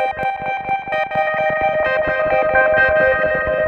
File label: 130 Lead, 130bpm; Nu Dance Electro; Zero-G; Soundclash; Combi Loops; The Oldskool